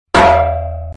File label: railroad dong